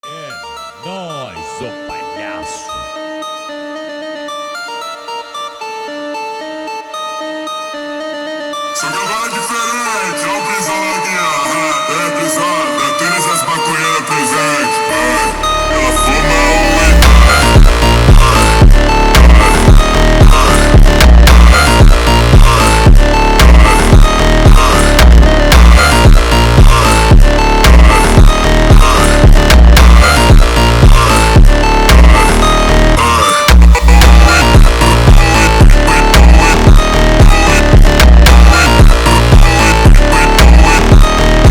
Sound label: Brazilian Phonk